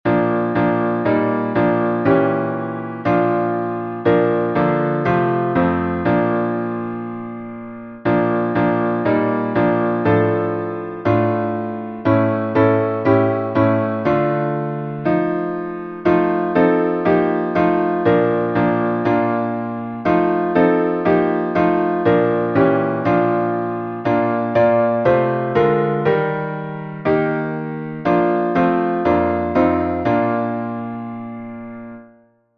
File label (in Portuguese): salmo instrumental